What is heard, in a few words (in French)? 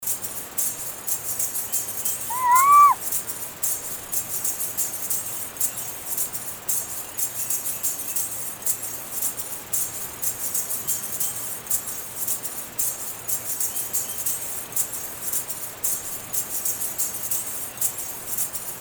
SANS VOIX